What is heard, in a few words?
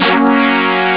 jump; ST-XX Amiga Soundtracker Sample Packs